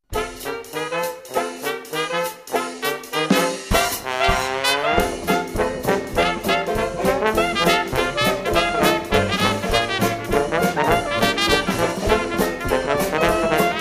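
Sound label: Oldtime Jazzband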